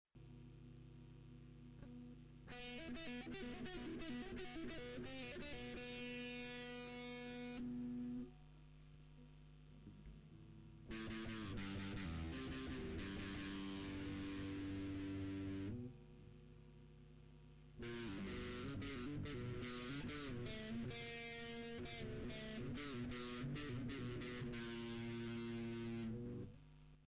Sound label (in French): Gamme, Guitare